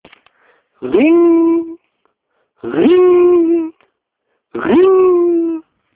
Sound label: rrrring